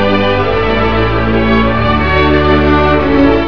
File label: Violin Melody